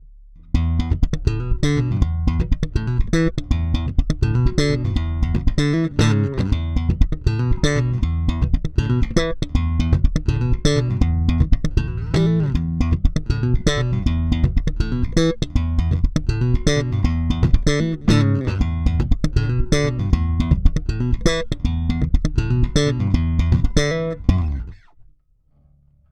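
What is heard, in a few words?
Both active Slap, bass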